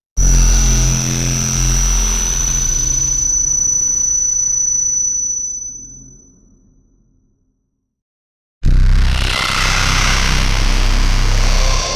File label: horror movie effect; movies